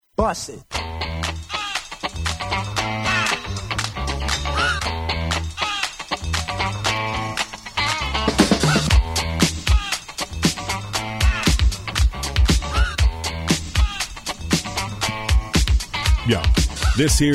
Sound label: Rap Round, rap battle; 2015 Downtown Throwdown; Round 3